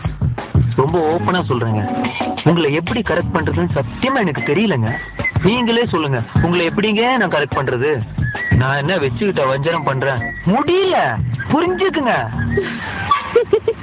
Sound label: Bas